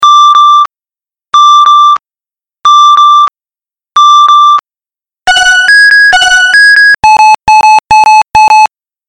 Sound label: Alert